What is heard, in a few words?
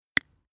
scale